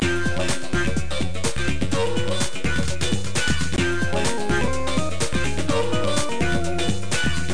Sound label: Music Loop; 300 games for mac; Arcade; MegaPong